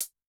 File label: Close, HiHat; Yamaha DTXpress-II